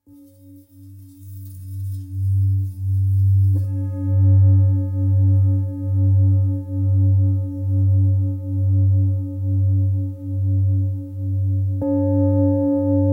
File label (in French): Bol bengale do diese